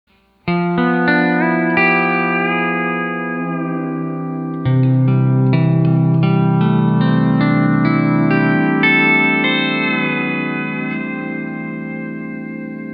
D13 13b9 and Major 9th Chords; D13 Copedant Tabs

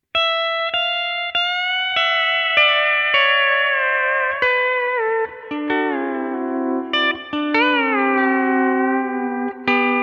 Delay; AXE FX 3

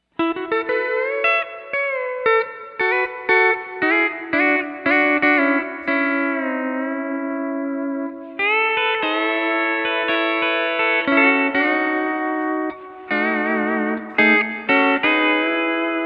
12 String Keyless, Steel; Excel

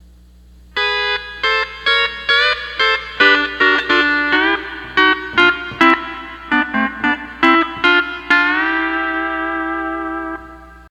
12 String Lap

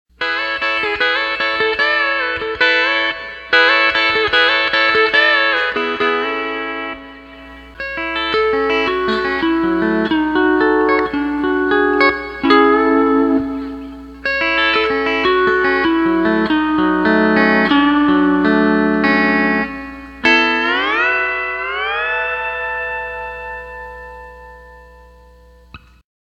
Cajun

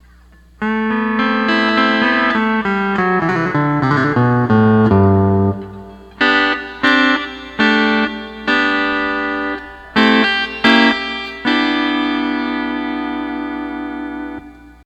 12 String Lap

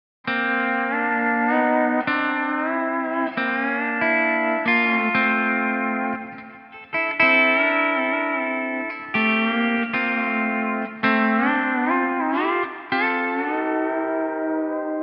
Night Plate Reverb, D13 Copedant; D13 Copedant Tabs

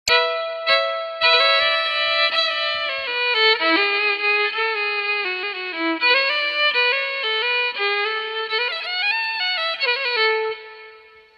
Fiddle; Quilter Steelaire Pro

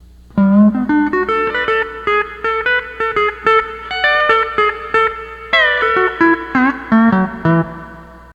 12 String Lap

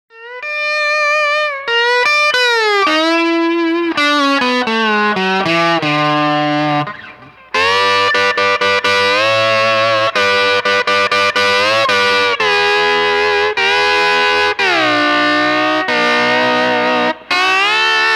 Steel Distortion, Allen Chihuahua Amp

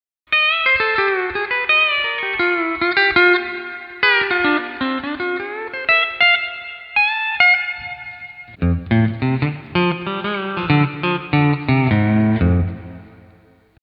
D13 Single String; Plus RKR

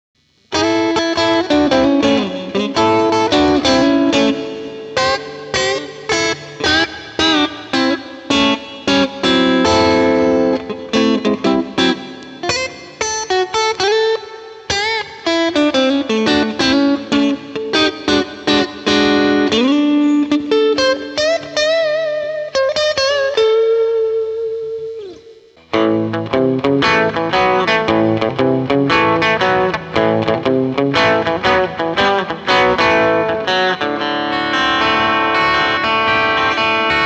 Guitar Drive, AXE FX 3

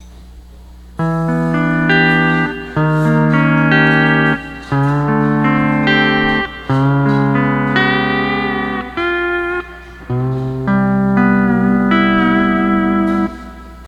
12 String Lap

